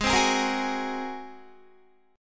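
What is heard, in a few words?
A flat 9th A flat Guitar, chord; Ab9 strummed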